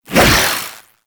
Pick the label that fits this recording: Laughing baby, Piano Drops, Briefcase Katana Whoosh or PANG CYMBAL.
Briefcase Katana Whoosh